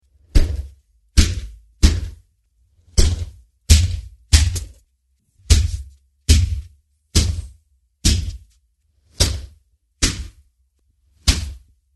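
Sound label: sounds of people fighting and fist punches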